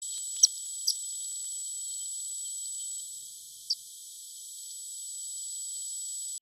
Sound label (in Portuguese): canto do macarico de sobre branco